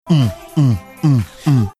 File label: mimicking bass beat